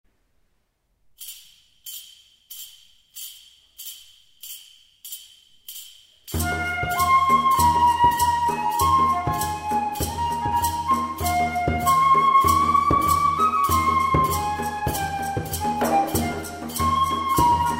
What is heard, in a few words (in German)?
Musikalische Meditationen Tanz